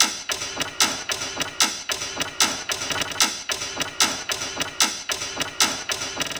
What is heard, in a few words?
Autogunner Texture 150; spectrum